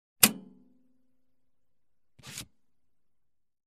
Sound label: Floppy Disc eject